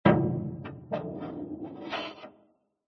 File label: impact metal huge heavy hit with scrape